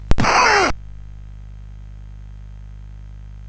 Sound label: sid CSG 8580R5